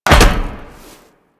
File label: Land Heavy Metal Perforated, Footsteps; Warframe; Tenno